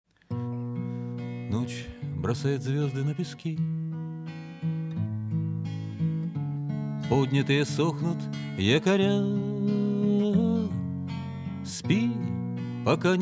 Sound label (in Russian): Колыбельная